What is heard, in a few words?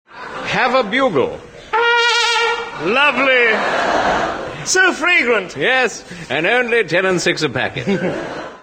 bugle